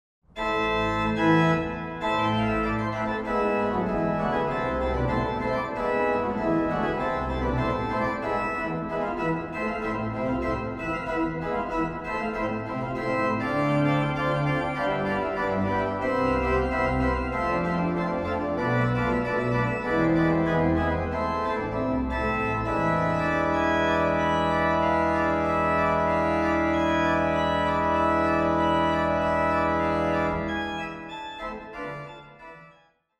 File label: Bach Organ Concerto in A min; BWV 593